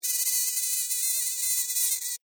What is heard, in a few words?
Mosquito Fly By, Animal, Mosquito, Fly, By, Wings, Insect, Bug; Cartoon, Land; Mosquitoe; BlastWave